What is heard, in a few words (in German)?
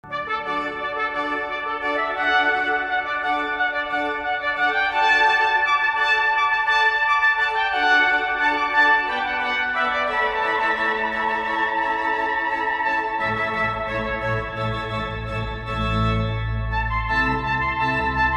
trompete orgel